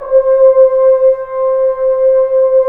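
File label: brass french horns, Roland; Roland - LA Composer vol. 1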